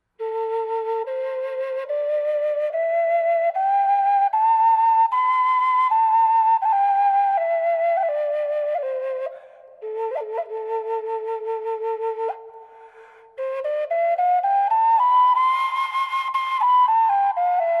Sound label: Am Back Pack Flute wet; flutes; Laughing Crow